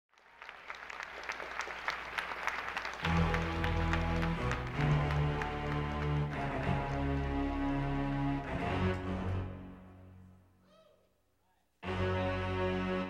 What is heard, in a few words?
Guitar Concerto